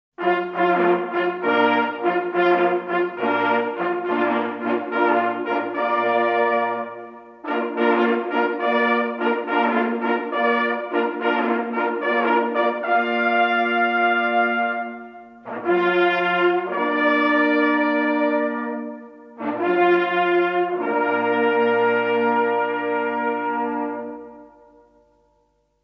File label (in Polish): pioro na rozkladzie, sygnaly